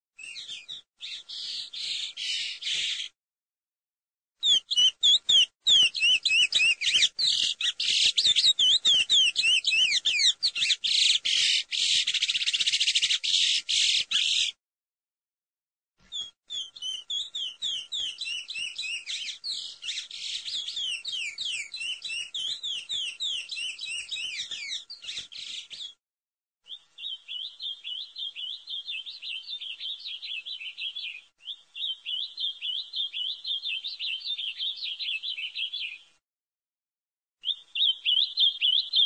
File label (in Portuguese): musica fundo; aves bicos